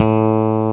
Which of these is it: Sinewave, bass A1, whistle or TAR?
bass A1